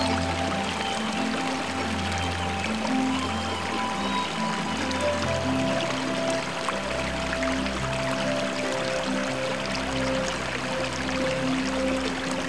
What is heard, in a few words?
music and water; Click the picture to hear the temperate forest.